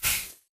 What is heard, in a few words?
bullet light ballistic sand debris; Titanfall 2